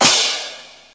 menu hit

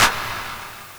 soft slider tick; osu; beatmaps

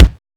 normal hit normal; osu; beatmaps

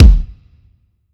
taiko normal hit normal

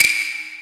normal hitwhistle; osu; hitsounds; deadcodehs

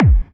soft slider tick; osu; beatmaps

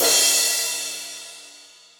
normal hit finish; osu; beatmaps

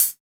soft hitclap; osu; beatmaps

